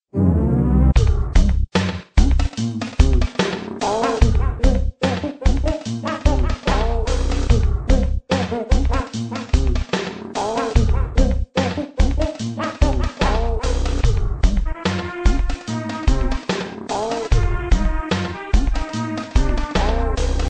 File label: stage clear diddy